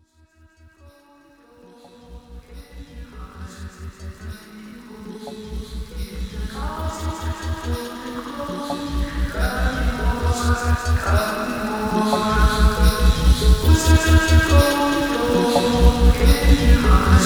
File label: remix